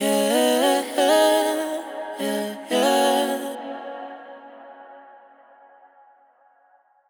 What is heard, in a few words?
Ad Lib Clouds - 135 BPM G# Min Wet, Vocals; Cymatics; ZODIAC Beta Pack